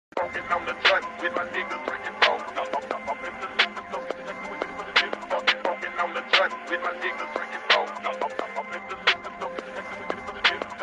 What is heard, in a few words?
Phonk JDM Drift; Japan